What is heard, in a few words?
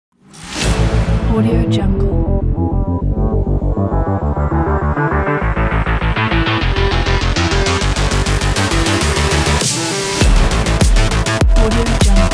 technology robot sport